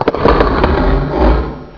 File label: bite, lurker, monsters; AoMClassic